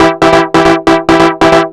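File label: hooklines, synth, techno, 138, e; Techno ID; instrument-loops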